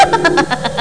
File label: f laugh